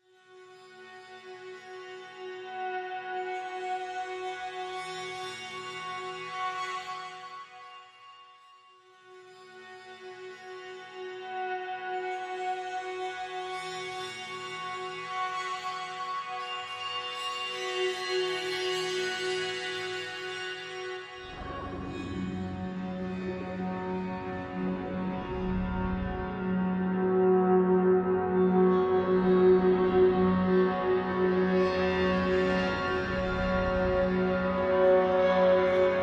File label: Drone, Light